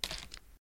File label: Weed Killer No More; lethal company